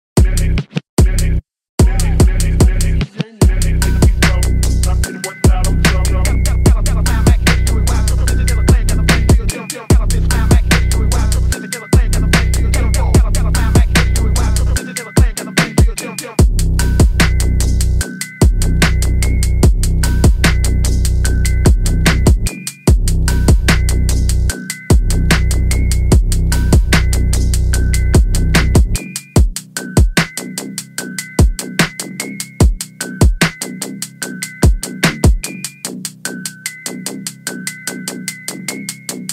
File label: Drift Phonk